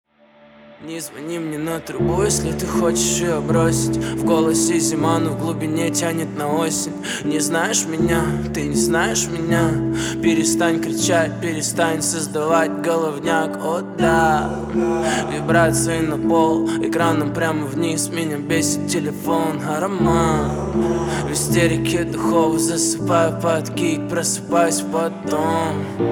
piano version

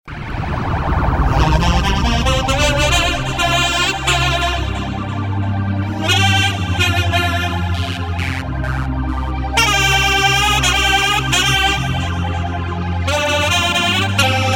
slow saxophone

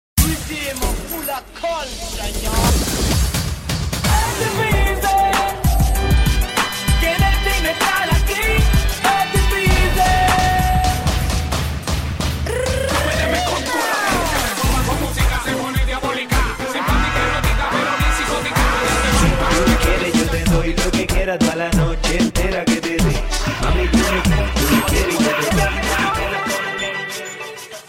latino remix

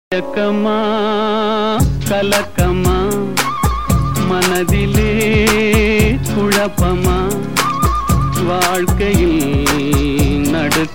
tamil cool mix

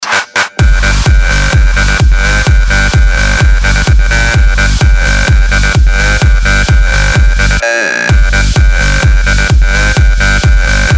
party bass krunk remix

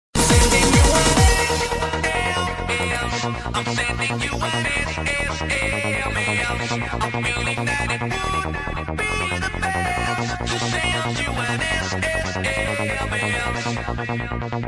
trance sms

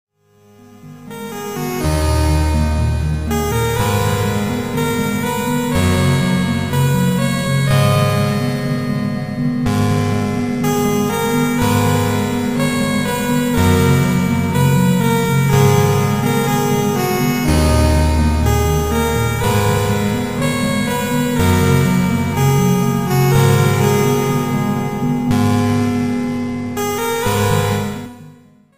best electro ringtone

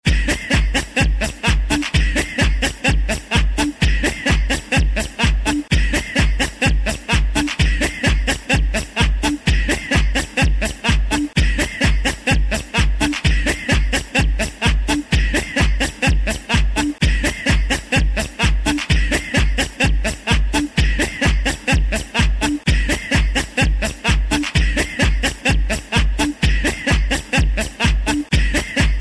laugh

